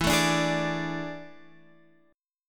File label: A Major 7th Suspended 4th E